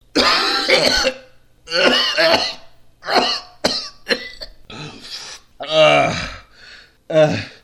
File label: vomit